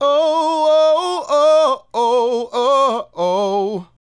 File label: Male Solo, Hip-Hop and RnB Vocals